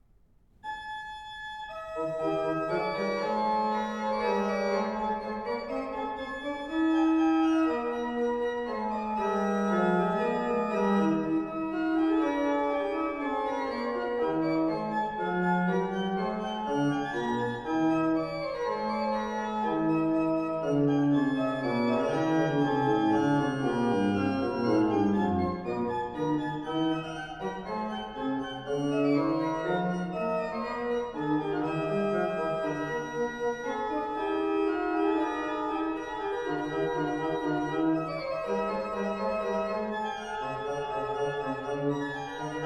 Organo ad libitum Fuga. Allegro, Organ Concerto in A major; Handel; HWV 296